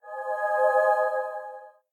angel announcement; among us

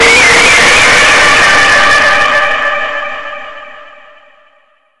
scrapped scream; dipsy

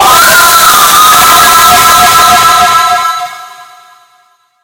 jumpscare; five nights at tubbyland

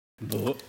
mmmbooohhhh

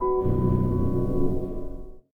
chime; disco elysium

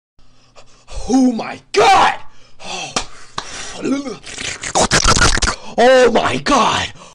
slurp; smg4

